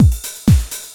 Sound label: Loop, Roland; Sound & Vision - Gigapack I